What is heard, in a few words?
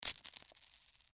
Coins Dropped; The Adventures of Puss In Boots - Catch The Thief; NextlevelGames